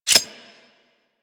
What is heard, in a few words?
Heavy Shotgun Reload; Weapons; Warframe; Tenno